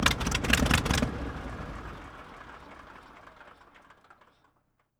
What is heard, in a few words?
engine stop; zero; planes; gmod; lvs planes